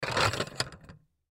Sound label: Dishes Stack Up, Dishes Stack Pick Up; Dishware; Blastwave; Household